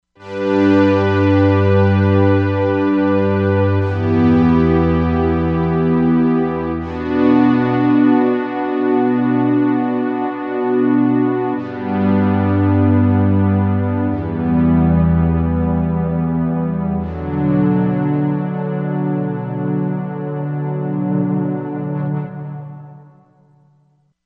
Pad 2, Korg MSC-07S Synth

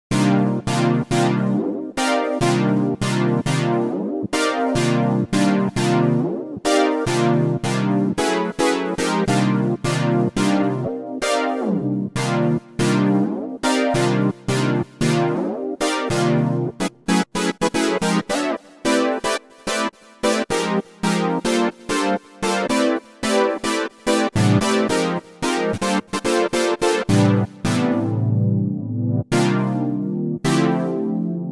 Chord Memory

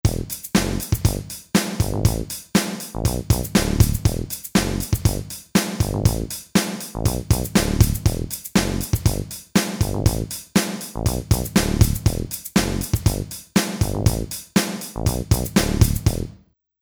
Rap Hip Hop

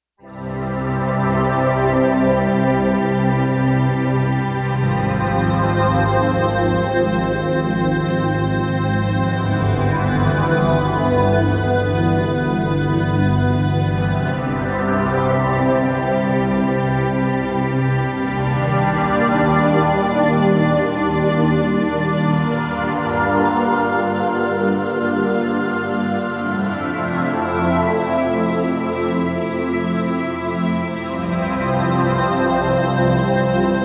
Slow Pad 5th; Digital Synthesizer

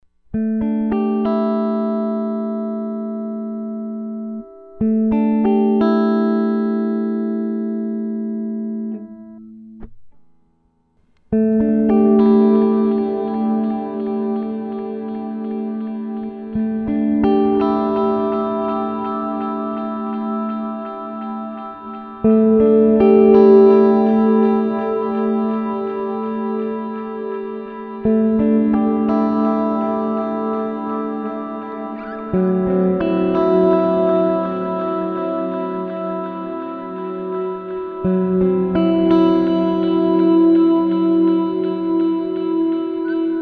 Earthquaker Devices Avalanche Run

